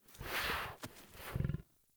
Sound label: Wolf Steps Jog Stop; Animals; Foley Actions